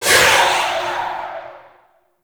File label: Rifle Tail Indoor; Zariman Grn Wraith